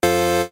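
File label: sounds for notifications on phone in 8-bit style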